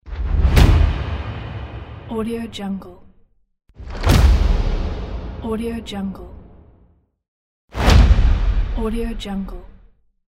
cinematic impact hit; ambienceworld

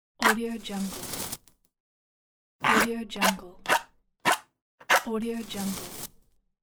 lighting a match; aetherfx

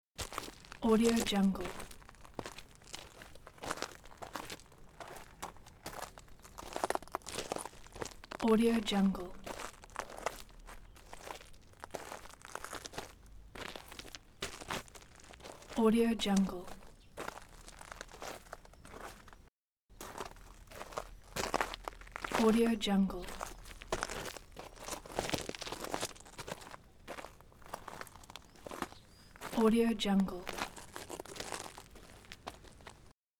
steps on gravel; dropstudiomarket

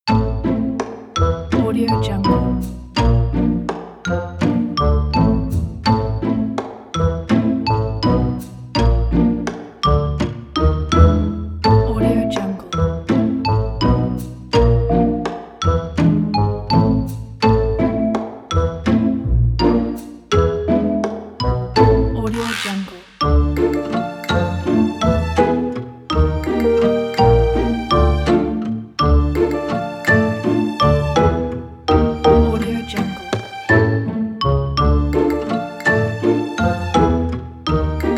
funny

